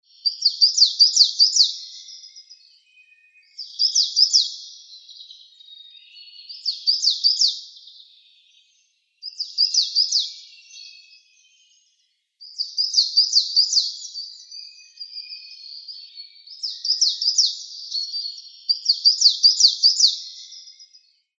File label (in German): Tannenmeise; Voegel